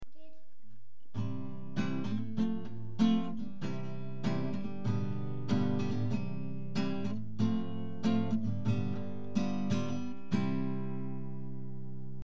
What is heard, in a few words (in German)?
gitarre